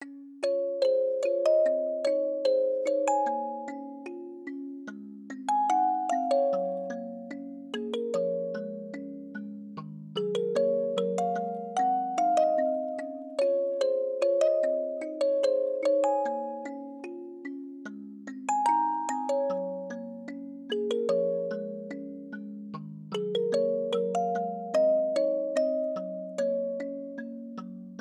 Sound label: Music Box